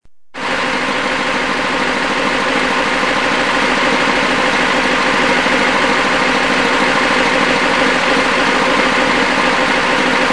Barco sala maquinas

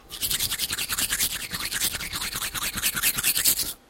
el cepillado

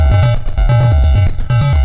tambor

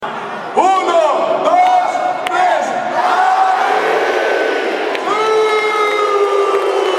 hala madrid uno dos tres

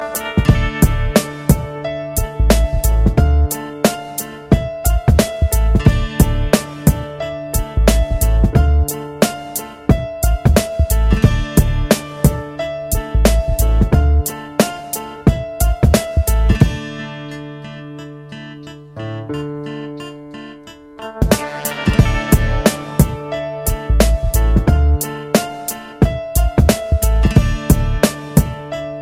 base hip hop

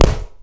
pinchazo globo revienta

